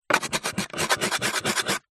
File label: office chalk scribble on blackboard